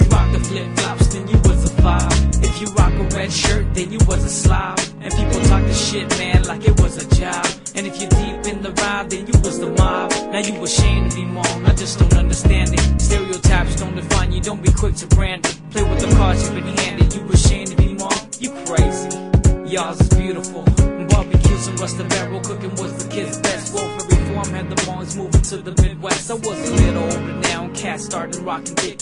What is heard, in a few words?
hmong plucky